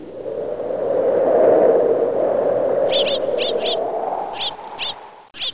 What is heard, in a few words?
ball